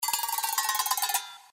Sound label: BONUS COIN JIGGLE; slots; Magic Coin